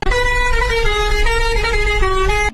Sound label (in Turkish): kamyon kornasi